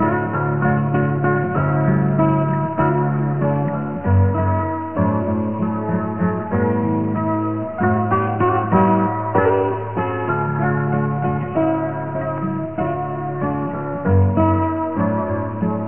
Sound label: gitar